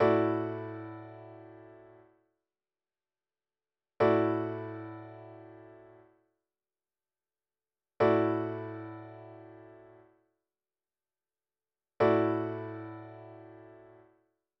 D6add9 A

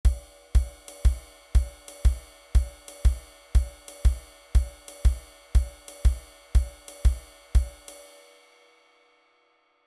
midi

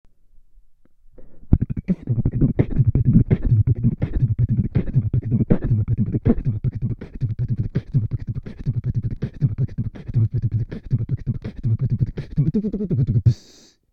kick

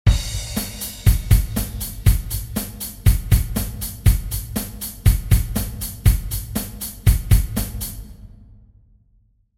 8beat bass

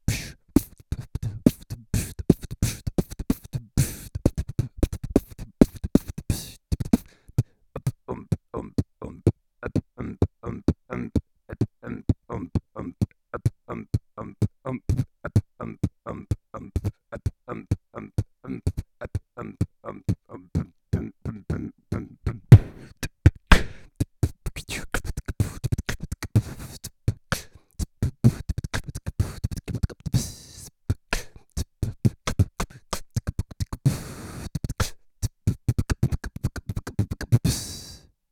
vocal bb line

